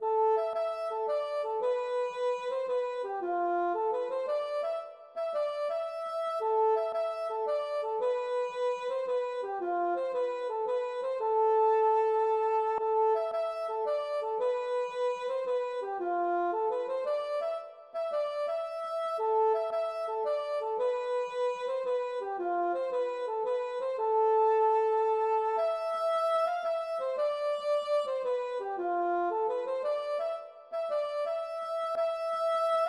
mazurka

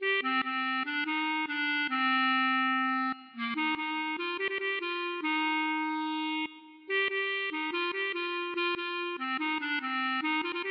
klezmer

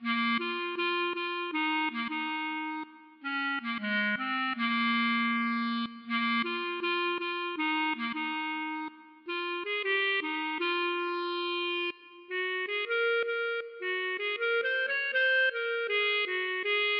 klezmer

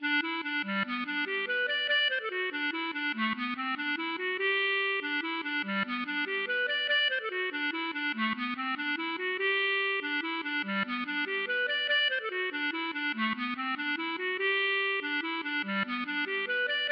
mazurka

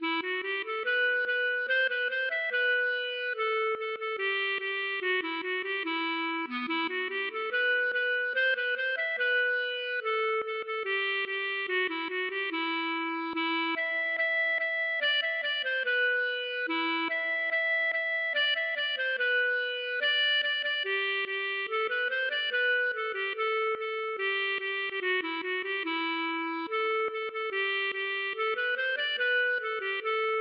klezmer

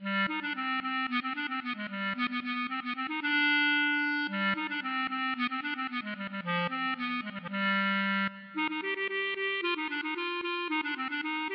klezmer

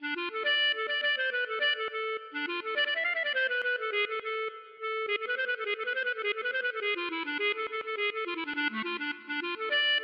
klezmer

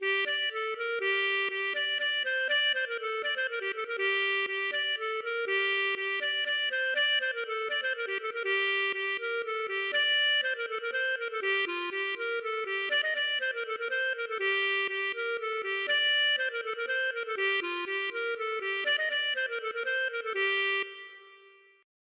hanterdro

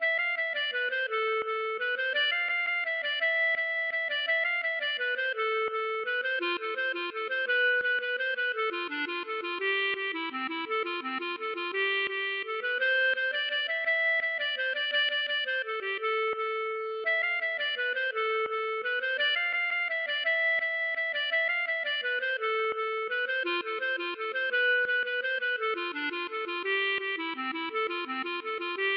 mazurka

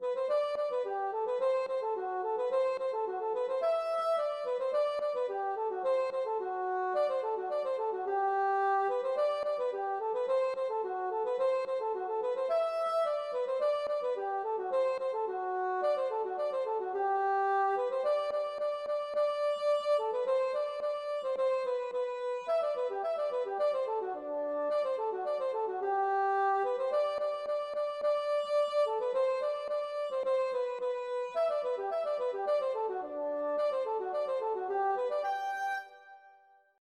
polka

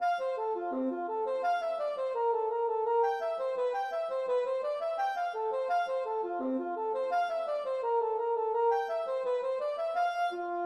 polka

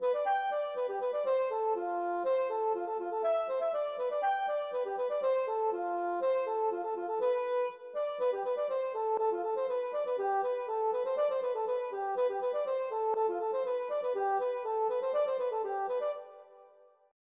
polka

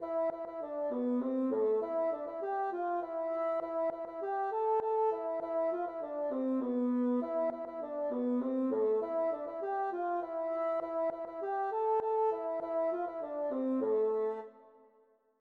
hanterdro

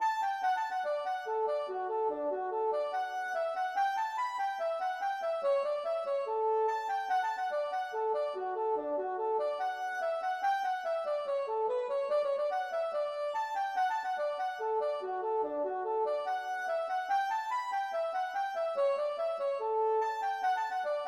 hornpipe

